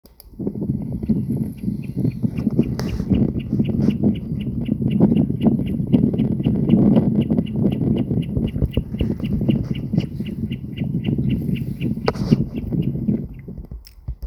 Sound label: Common Snipe, songs